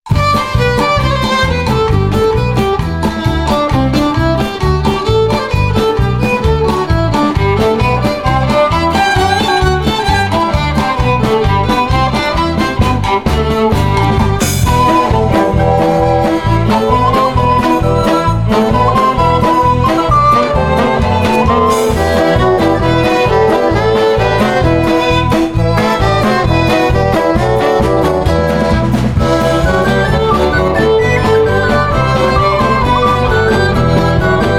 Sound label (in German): Sternpolka